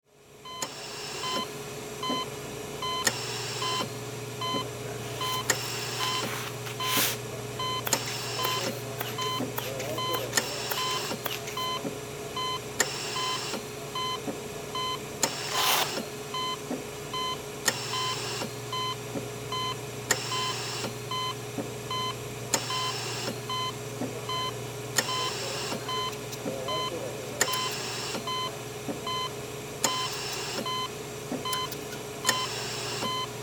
Hospital

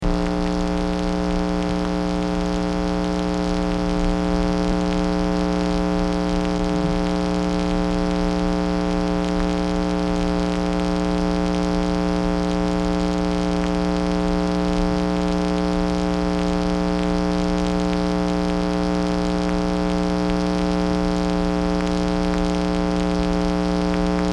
Electrical Noise

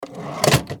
Cash Register

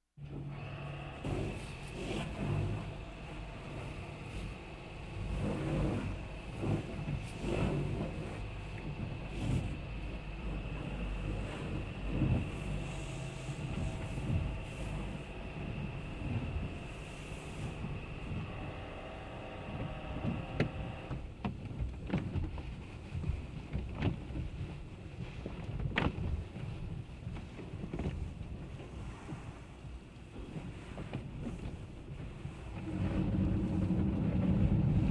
Car Wash Interior Perspective

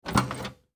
Auto Open Trunk

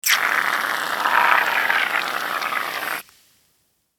Shave Cream

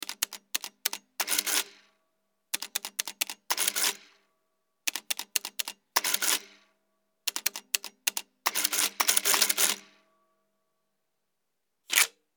Adding Machine

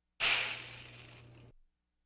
Sizzle Light

